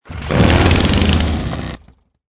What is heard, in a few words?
vehicle moto rev down; mohaa